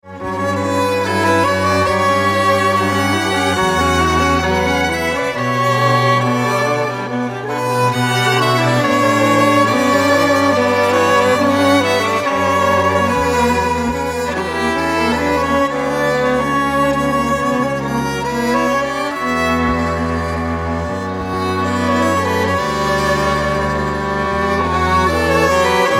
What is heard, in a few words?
sad violin; nuage particules